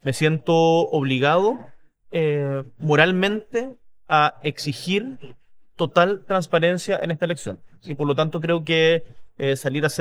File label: vocals